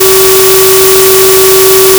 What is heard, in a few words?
fm voice single2; spectral convergence; FFTimbre